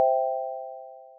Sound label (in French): chime poste